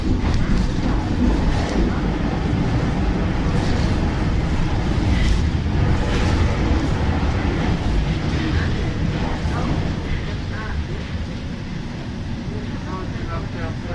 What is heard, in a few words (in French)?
virage; Temple